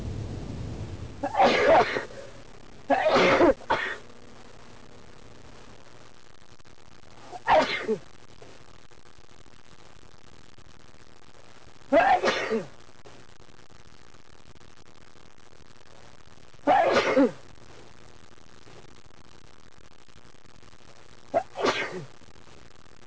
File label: car sneezes